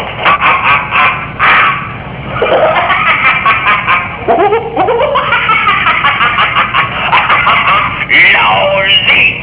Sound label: laugh